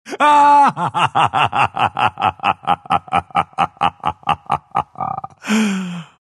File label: pangolin laugh; dota